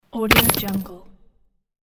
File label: buy item